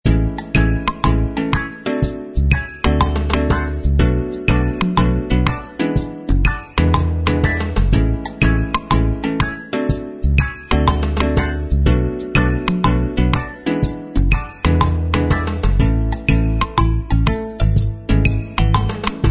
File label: music; black-jack-puzzle; shockwave